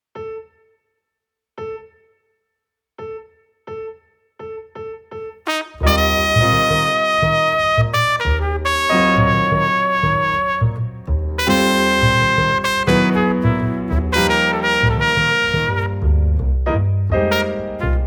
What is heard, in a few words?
Drums